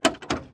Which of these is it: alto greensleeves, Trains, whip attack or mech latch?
mech latch